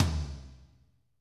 tom, Pop Country, Wet

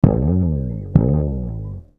FUNK BASS; Zero-G; Funky Elements

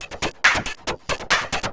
percussion loops 138; PS-45; Techno

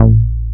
JNO06 BS A2; Drums In Your FACE!

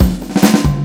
Fills, Loops 140 BPM; Vengeance - Essential Clubsounds Vol.4

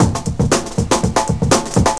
pitchy snare

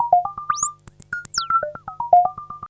POLYMOD; AMG - Vince Clarke Lucky Bastard

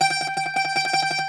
GR. BAZ, Plucked Strings, Greek Bazouki; E-MU Producer Series Vol. 7 – Old World Instruments

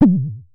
Synare Filter; Star Instruments Synare 3

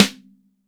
MIX SN, DRY STANDARD SNARE

